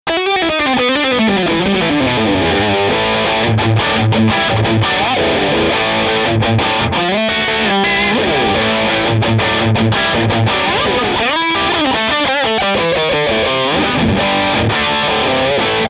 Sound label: Dirty Ibanez S470